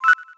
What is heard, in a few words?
Button Sharp